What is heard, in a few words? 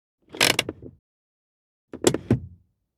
handbrake, Car Interiors; Sounds Great